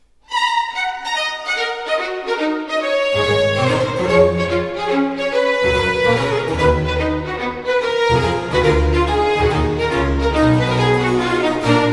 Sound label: Sinfonia in e minor Vivace